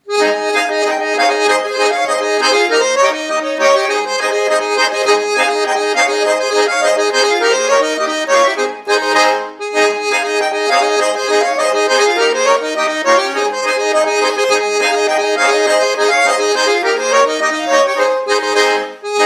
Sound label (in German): gäuerle